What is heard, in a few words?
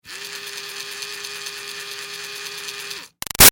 Toy Fairy Wand CU Run Short; Toy Fairy Wand 2; Miscellaneous Toy; Toys; Big Room Sound